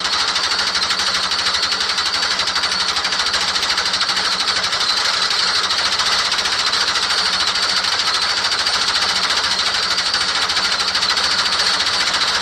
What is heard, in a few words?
Rotary Mower Stdy Lo